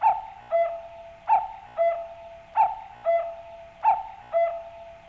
watch

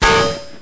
shield press

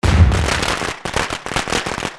solid trap hit

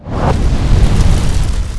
attack; blazzer

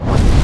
flame ghost attack

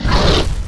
burning fang attack

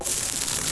horn scaraba move

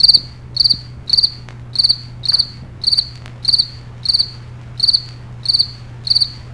bug

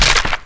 wood goblin move

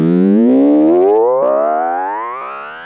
hovering

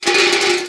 waste stove damage

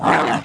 attack; tha odium

